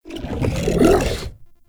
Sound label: Infested Shotgun Idle One Off; Weapons